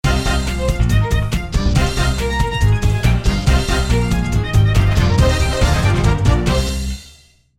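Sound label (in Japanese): オープニング, アイキャッチ; アイキャッチの楽曲です。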